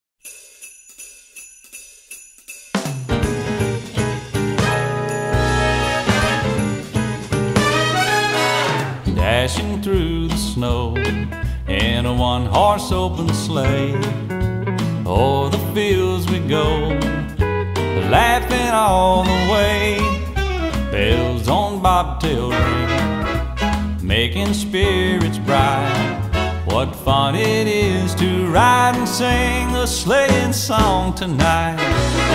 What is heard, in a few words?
Jingle Bells